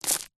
foley dirt very small stones debris handful drop ground; zapsplat